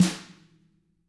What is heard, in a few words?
tama hit; ILIO; Double Platinum Drums 1